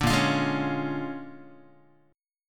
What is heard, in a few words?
Bb 7th Sharp 9th Bb, Guitar; Bb7#9; strummed; JGuitar